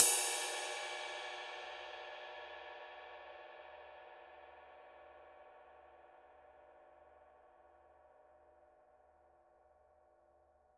21 dark dry ride body; paiste; masters

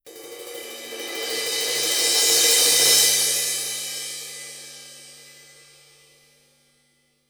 19 crash roll, paiste; pst 7

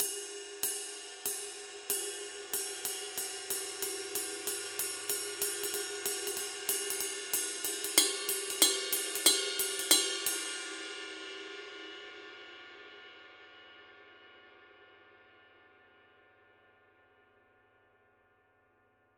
formula 602 22 med ride pattern; paiste; classic sounds

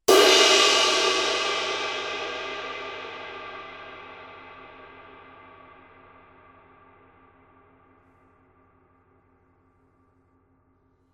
22 dark crash ride edge, paiste; masters

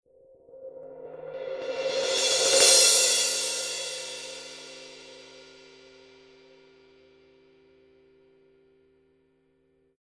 900 series 18 heavy crash roll, paiste

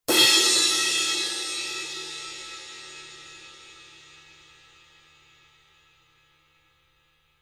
paiste signature 18 fast medium edge

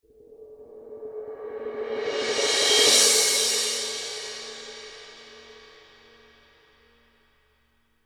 paiste signature traditionals 17 thin crash roll

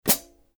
16 hi-hat chick; paiste; giant beat

